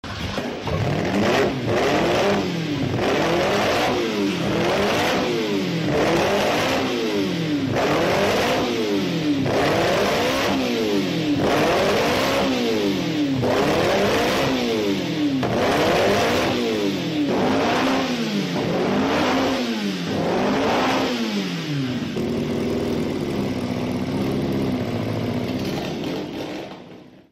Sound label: Alfa Romeo Tipo 33 STRADALE with SOUND